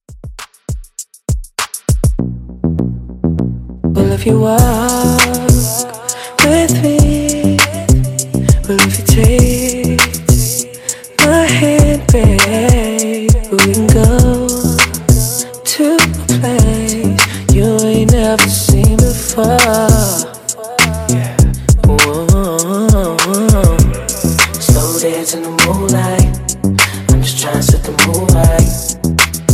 HipHop Redrum 100

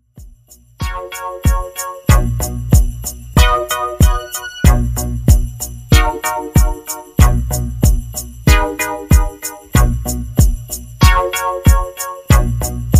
70s Christmas Redrum 94 Bpm

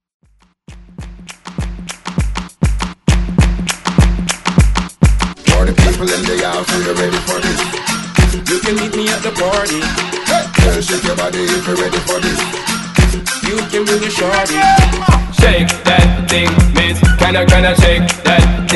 Throwback Redrum Hype

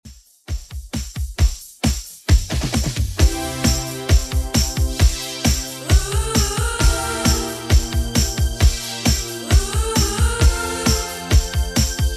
80s Redrum, 133bpm

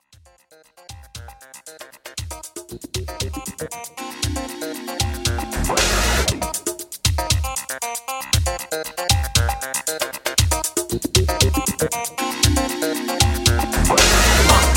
Intro Outro Party 117 Bpm Clean

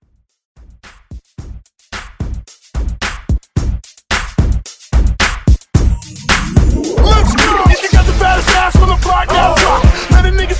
Throwback

